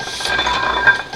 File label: MACHINES